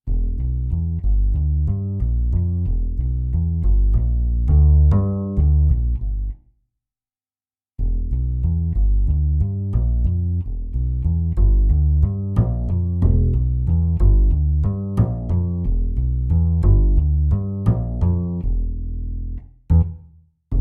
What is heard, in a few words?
Bass acoustic noodling; XV-88